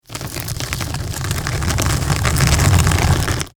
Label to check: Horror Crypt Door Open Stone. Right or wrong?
Right